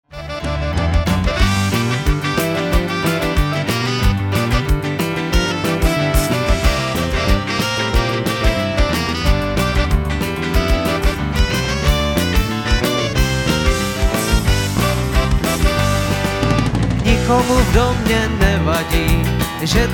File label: Honky Tonky Blues